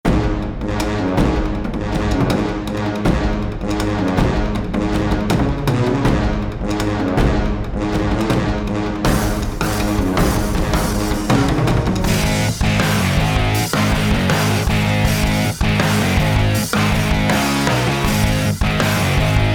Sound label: Big Battle